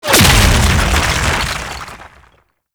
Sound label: Sentient Vessel Laser Explosion; Warframe; Tau; Abilities